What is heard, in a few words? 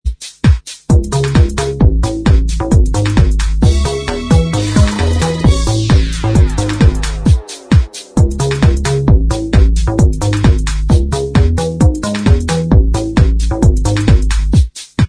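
instrumental, backing tracks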